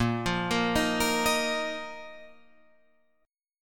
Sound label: A sharp Suspended 4th Guitar Open F; arpeggiated; A#sus4; JGuitar